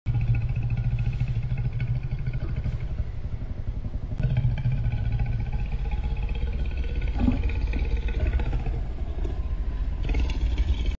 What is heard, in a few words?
black panther, پلنگ سیاه